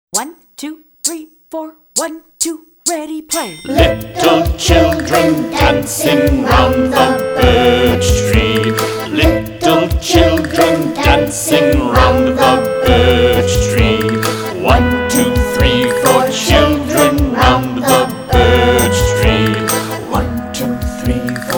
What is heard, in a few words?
russian folk dance